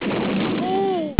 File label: boom